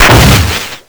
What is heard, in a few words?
blast